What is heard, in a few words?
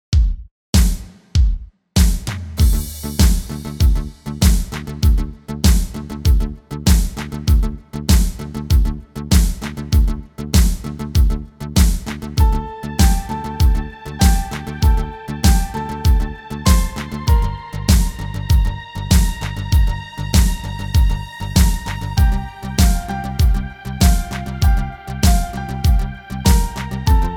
Wind and Rain REMIX; Ветер и дождь